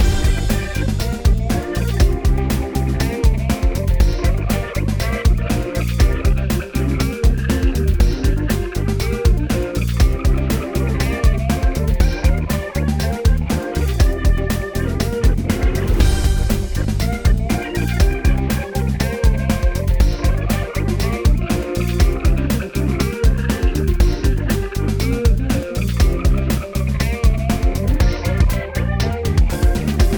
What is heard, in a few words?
win loop